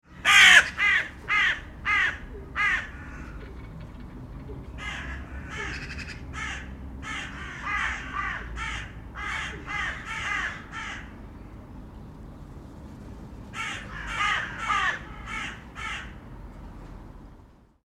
Crow call - Close at start; Birds; Animals; Tom Hutchings

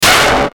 Hit Short Distortion, Hit Snare Rim Shot Reverb; Blastwave

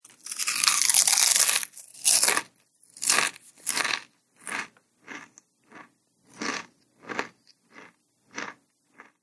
Potato Chips Munching; Eating Sound Effects; Andrew Fleming

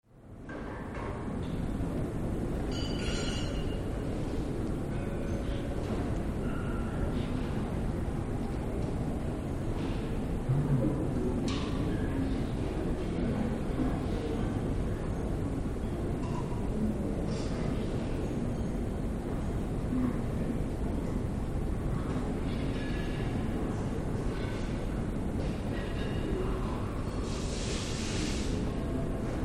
Airport departure lounge atmosphere medium quiet; Tom Hutchings

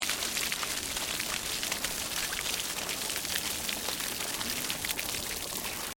Water Pour Concrete Small Loop; Industrial Water movement; Nature

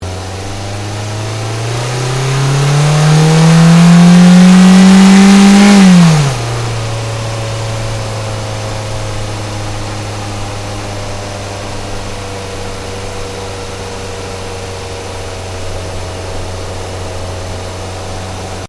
Car Ford Focus Accel Gear Change Engine Bay; Ford Focus hire car; Cars (Sound Effects:Vehicles)

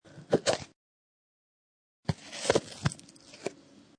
Plastic Cup Open And Close Lid; Plastic Sound Effects; Plastic (Sound Effects:Materials)

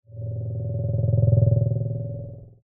Scanner Beam By, Scifi; Science, Fiction; Tech Sound Effects; BlastWave

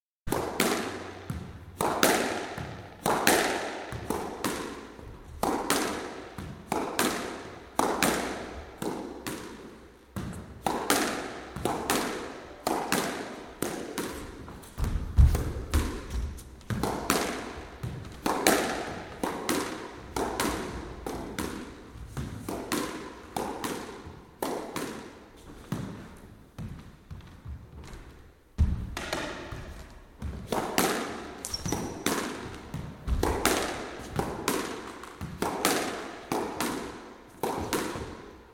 Squash, one person on court; Miscellaneous Sport; Miscellaneous Sports (Sound Effects:Sports); BBC

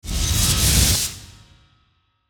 Whoosh Swirl Airy Suction; Blastwave

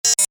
Tech beeps, Beeps, High Tech; Stuart Duffield

